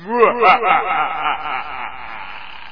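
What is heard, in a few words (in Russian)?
смех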